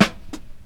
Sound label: verby steel snare drum sample g key; Duration 0:01; Samplerate 44100 Hz; snare drum samples